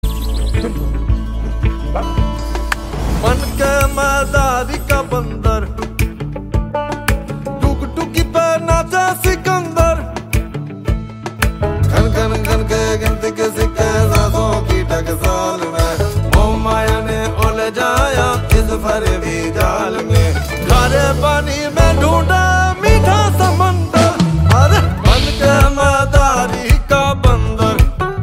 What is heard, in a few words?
punjabi